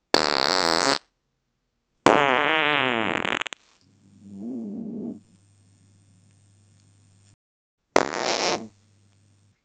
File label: farting